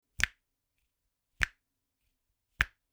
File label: Snap Dry